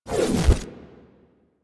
Anchor Rewind; Warframe; Protea

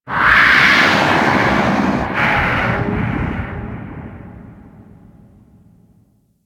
Ocean Kraken Bellow; Ambience; Warframe; Grineer Ocean

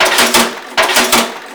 Shipyards Conveyor Loop; Ambience; Props; Warframe; Grineer Shipyards

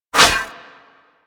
Disarm Dart Shot; Warframe; Warframes; Pacifist

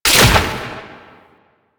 Orb Destroy; Warframe; Duviri; Conservation

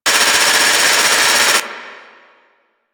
Turret Loop; Ambience; Warframe; Grineer Fortress

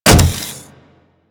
Riot Moa Step Default, Footsteps; Warframe

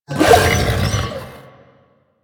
Pain Growl A; Enemies; Vocals; Warframe; The New War; Archon; Amar

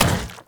Zombie Run Metal F, Footsteps; Warframe; Infested

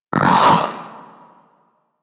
Vocal Bark, Pets; Warframe; Zanuka; Zanuka Companion A

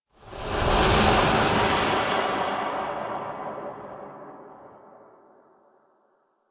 Transition, Ambience; Necralisk Hub; Warframe; Entrati

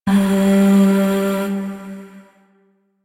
Void Shawzin Oct One G; Warframe; Zariman

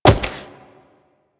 Wall Jump Default; Footsteps; Warframe; Tenno; Wall Run

